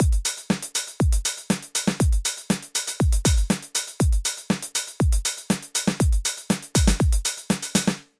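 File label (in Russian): closed hats, Ритмическая фигура закрытого «хэта» 2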